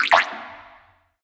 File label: Drip, notifications; Kyocera DuraForce Pro